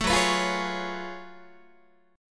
Gb Minor Major 11th Gb Guitar; strummed; 12,14,14,15,12,12; 4A,4E,5A,5D,5G#,6C#; JGuitar

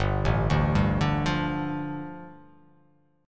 G 7th Suspended 2nd

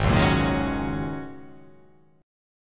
G sharp 7th Suspended 2nd Suspended 4th

